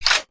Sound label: door open